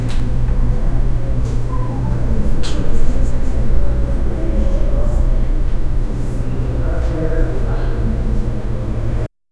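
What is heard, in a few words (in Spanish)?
murmullo suave y constante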